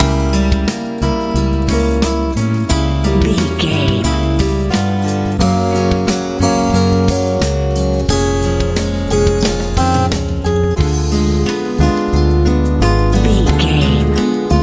pop ballad

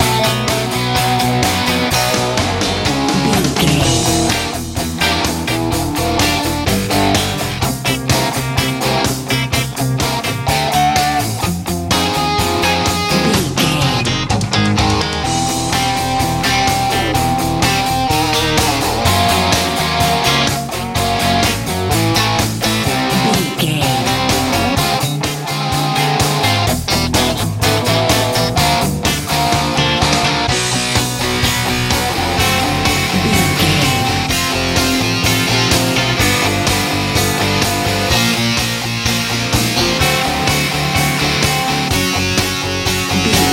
blooming warm texas rock

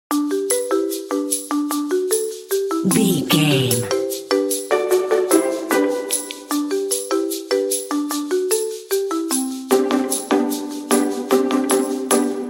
happy go lucky loop

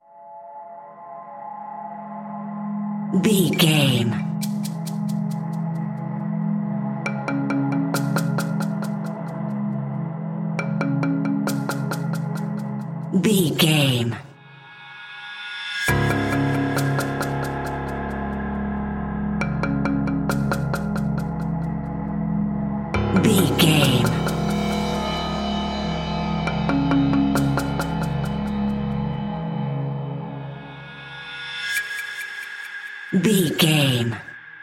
wilderness tension

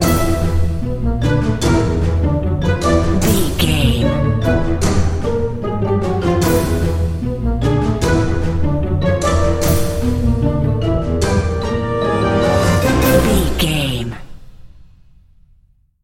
commotion hustle bustle stinger

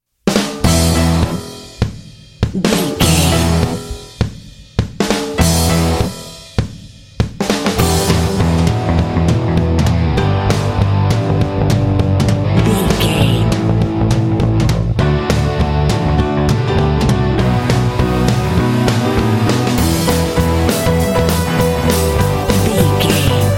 no vocal

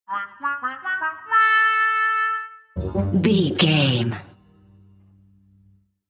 cartoon

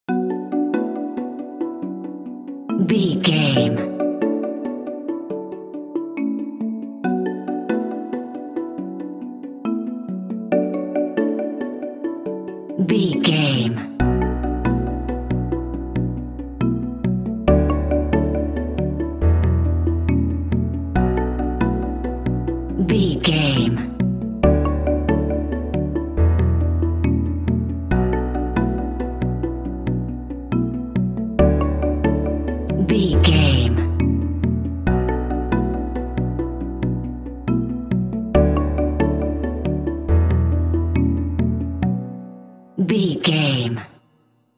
atmospheric warm mallets and piano

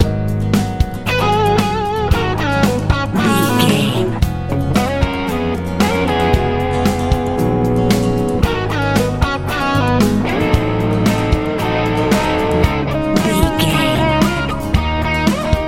fun big warm airplane ride love easy pop

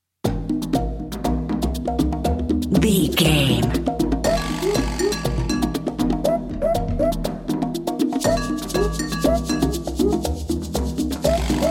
jungle hijinx percussion

